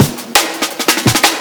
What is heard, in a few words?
pitch tuned t175 further EQing comp bad